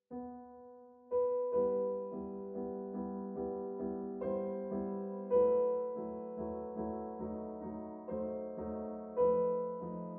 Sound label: Prelude; Chopin; Pianoteq 4